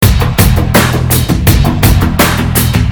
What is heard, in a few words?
depressing rock music